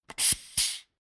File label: Industrial Air Tool Connector Coupling Connecting Pipes Air Released; Hisse